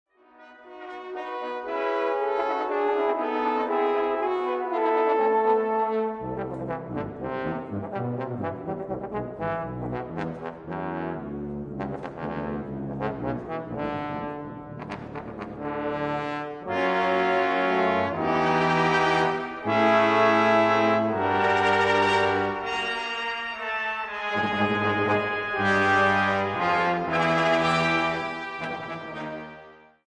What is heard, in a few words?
symphony for brass; Arnold